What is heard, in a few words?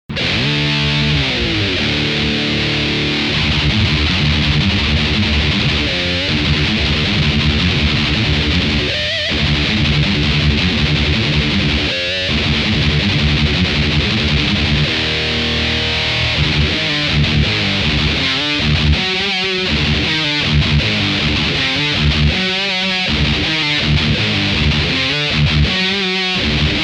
Metal, gitar; Metal Fest